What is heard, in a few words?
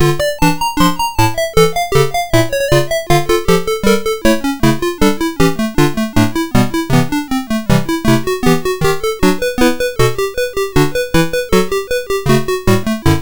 BUGS Level Remix, sid MOS6581R4; Chips Challenge